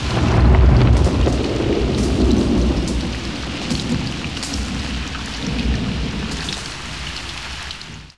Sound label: jungle, Rain & Thunder; SFX